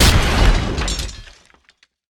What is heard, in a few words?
break metal